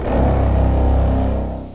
string; OctaMED SoundStudio V1; mirage

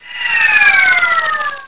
falling

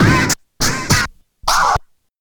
Scratch; Sonic Foundry ACID Music

